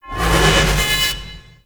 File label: Charge Ability Charge Up; Enemies; Abilities; Warframe; Duviri; Dax Knight